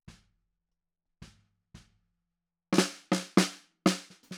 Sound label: Snare Bot